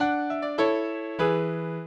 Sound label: minuet, mozart